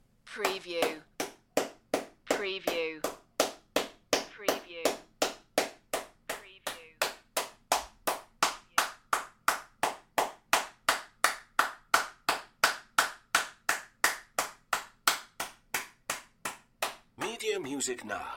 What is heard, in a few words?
Applause Single Person Clapping